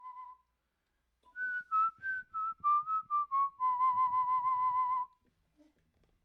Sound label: Whistling